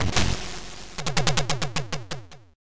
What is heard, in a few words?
Level Failed; Ninja